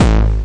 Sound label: BD Distortion, Bassdrums; Vengeance Samples